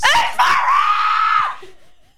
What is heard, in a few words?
fart